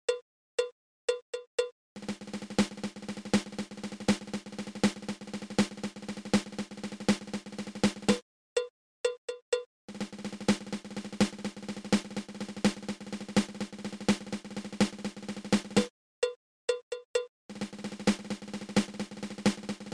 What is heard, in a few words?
Double Ratamacue